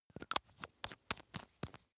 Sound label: makeup box screw cap close; haus-garten; badezimmer